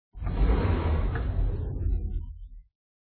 moonbase long door; Quicksilver

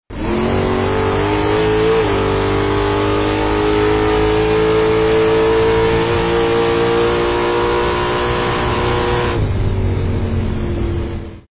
accelerate, drag race; Quicksilver

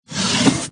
Drawers Open; Princess Room

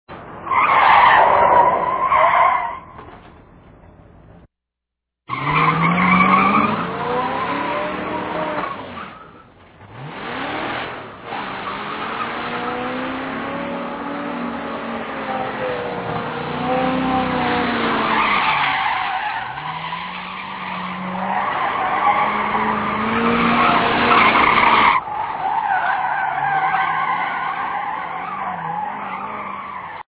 peel out, drag race; Quicksilver

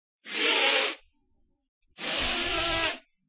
dino rhino; Quicksilver

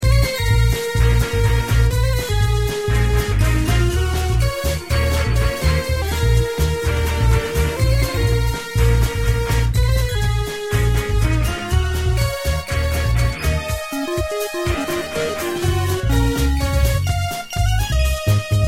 enemy, games music

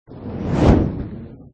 square low swoop

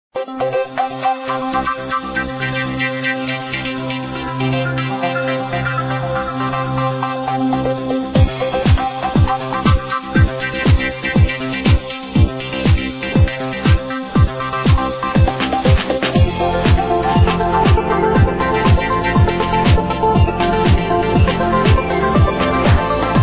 idol song

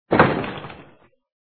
castle drawbridge; Quicksilver

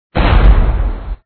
pirate cannon

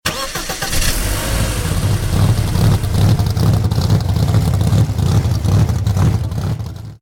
engine start; Quicksilver; Drag Race

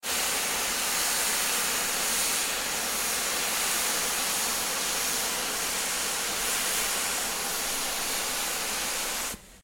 overheat; Quicksilver; Drag Race

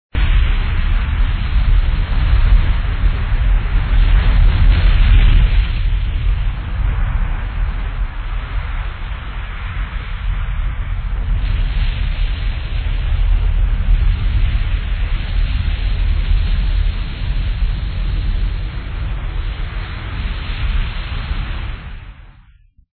dino unstable volcano

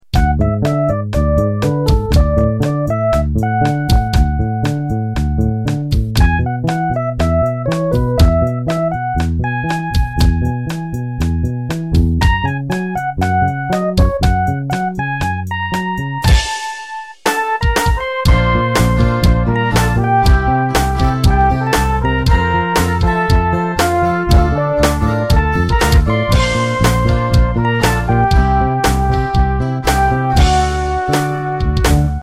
puzzle, games music

